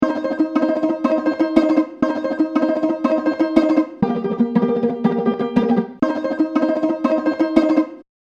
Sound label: balalajka